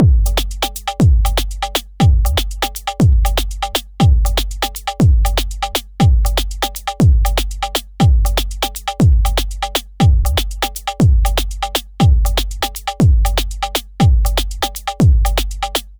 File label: delay manglers tonic wet; aas; objeq delay